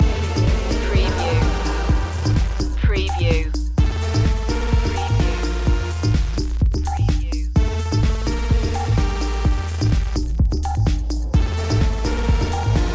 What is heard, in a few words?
urgent nature loop